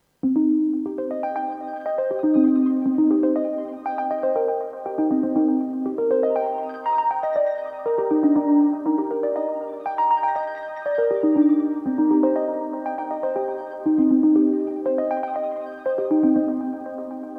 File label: Mini V4 Pernegg Klosterkirche; VSL MIRPro3D; Arturia